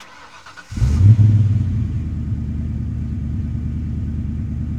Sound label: Mustang Start Loop, Automobiles